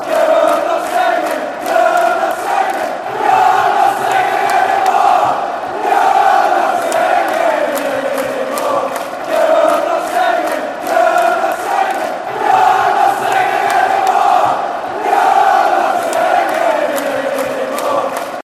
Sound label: youre not singing anymore; falkirk